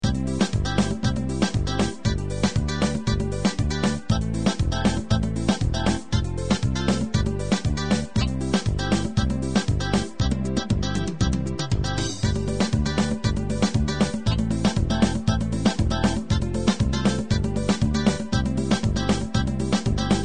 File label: carimbo